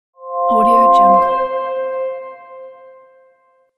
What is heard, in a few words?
ringtone; gevstudiox